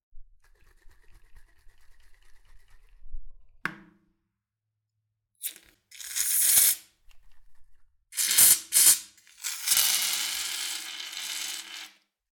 Shaking And Spraying Foam Shaving Cream

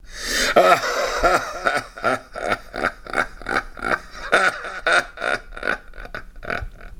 Evil Laughter

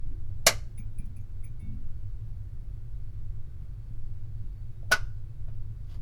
Turning On Stove Light

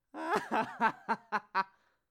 Laughter

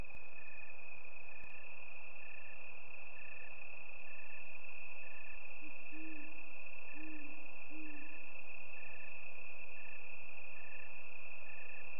Crickets

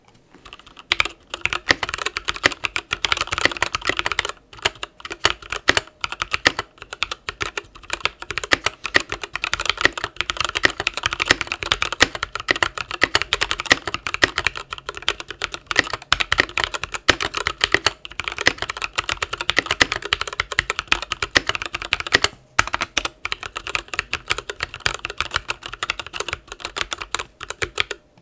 Keyboard Typing Brown Switches

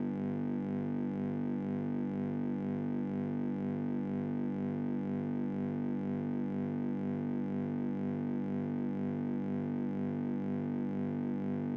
G Sine Natural Distortion Loop

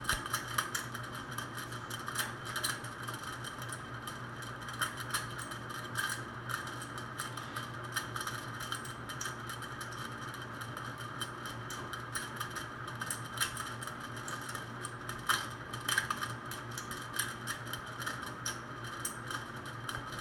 Ceiling Fan

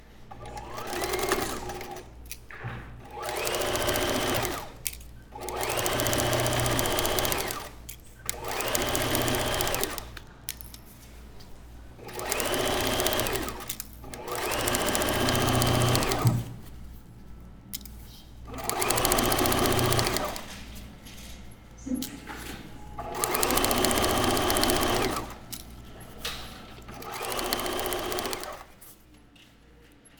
Modern sewing machine

